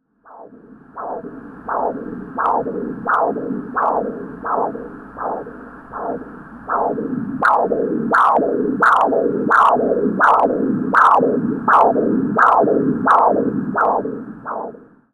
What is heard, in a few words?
hospital ultrasound